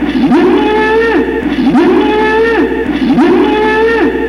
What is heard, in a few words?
Alarm